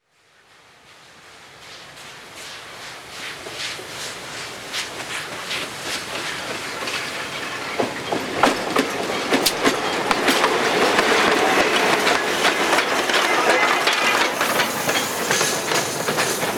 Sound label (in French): bruit joints de rails; sonotheque